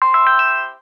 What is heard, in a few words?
Email received; Samsung SGH-i450v